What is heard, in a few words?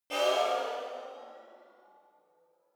sci-fi vehicle turbo activate; raumschiff